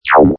Future, Button sound; Multimedia

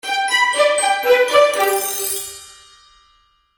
Magical Music Finale, Cartoon Music Sound Effects; Magical Music Finale 8

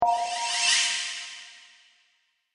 Game Stage Complete; video game

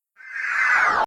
Whoosh Transition SciFi Disappear Descent; Quick; Scifi Science Fiction; Sound Design; Whooshes

